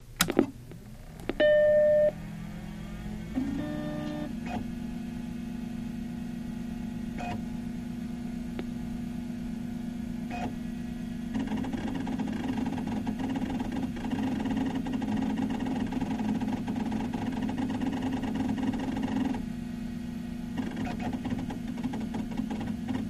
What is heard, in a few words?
Apple Se20 Switch On